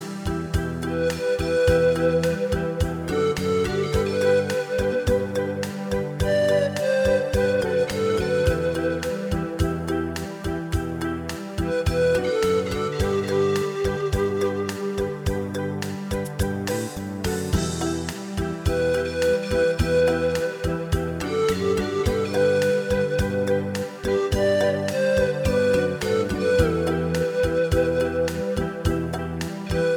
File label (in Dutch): panfluit